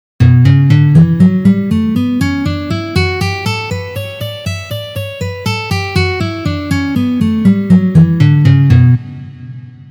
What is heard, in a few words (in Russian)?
Gamma Re mazhor Tri noty 2 pozitsiya